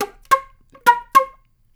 Natural Flute FX, Jazz Solos and Sections, Traditional; Flute Loops; Sonic Foundry (Sony Creative Software)